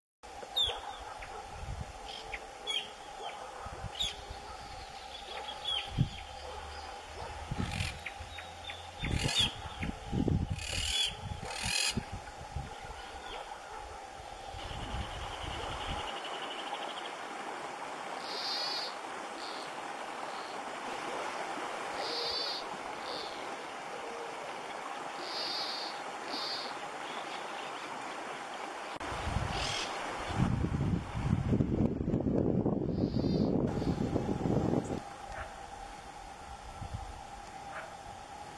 Canto do corta ramos de rabo branco

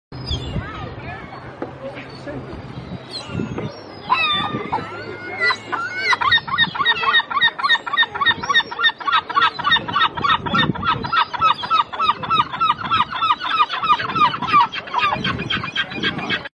canto da gaivota alegre

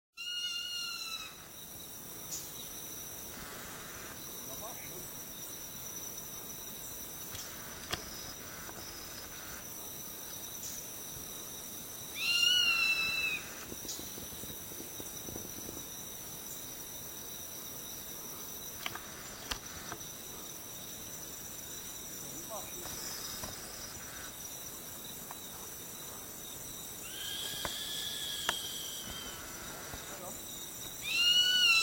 canto do gaviao de rabo barrado

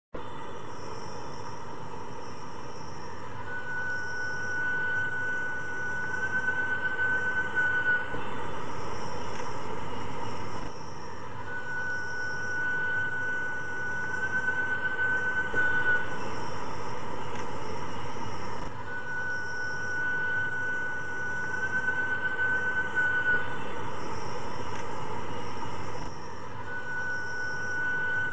Canto do inhambu relogio

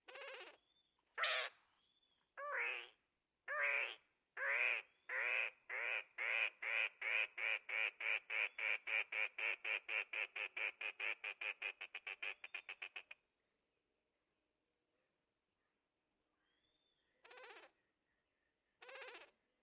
canto do cuco de peito baio

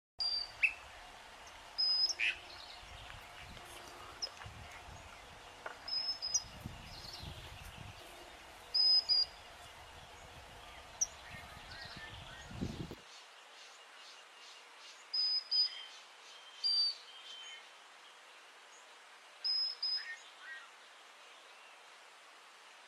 canto do urubuzinho

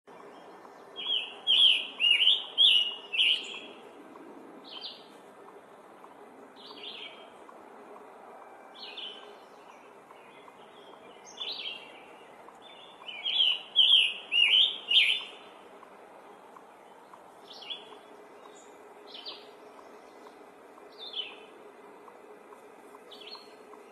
canto do sanhaco escarlate

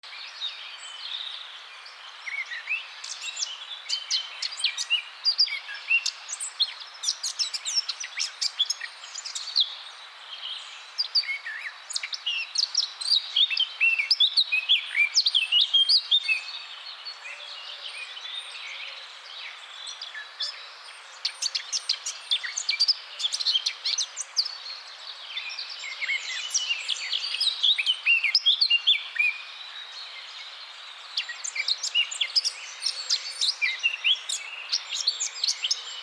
Canto do toutinegra de barrete preto

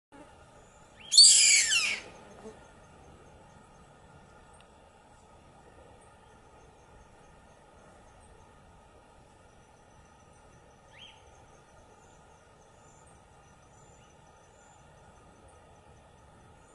canto do gaviao branco

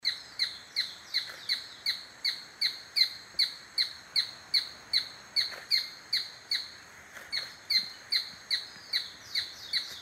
Canto do limpa folha riscado

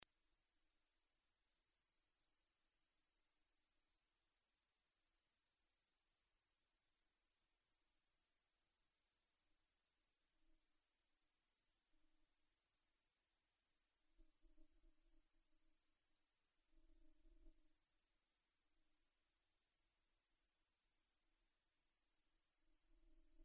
canto do balanca rabo leitoso

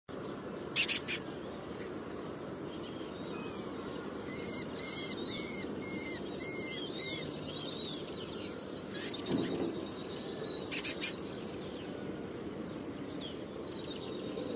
canto do peneireiro das torres

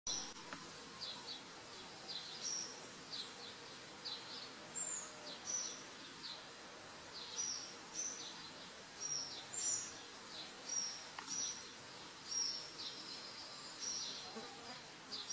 canto do balanca rabo de bico torto

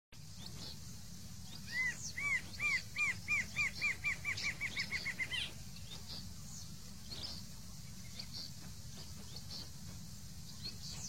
Canto da choca de asa vermelha

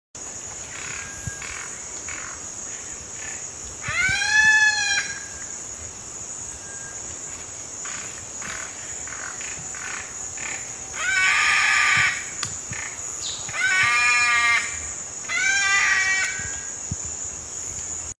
Canto do aracari negro

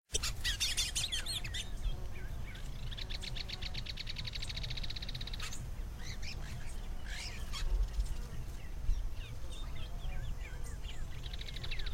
canto do cardeal de goias

